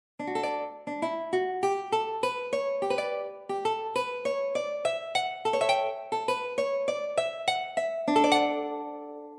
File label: Lute